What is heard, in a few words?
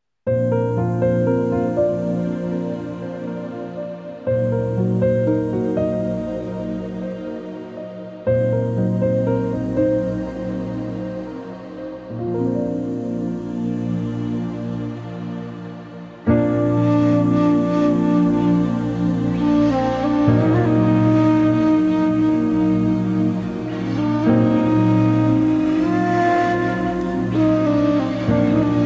Desert Lullaby